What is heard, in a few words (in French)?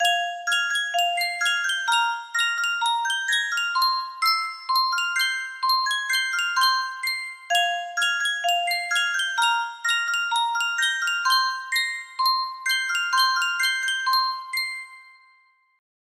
boite a musique